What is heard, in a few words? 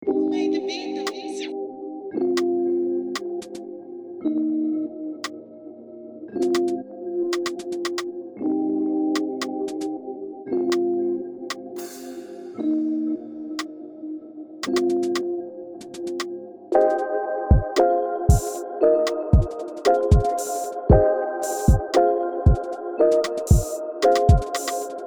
SPLENDID BPM115